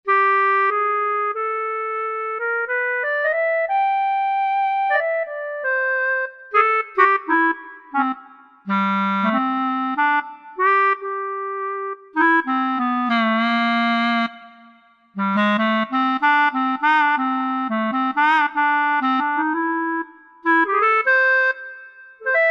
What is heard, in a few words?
Clarinet, Korg TR-Rack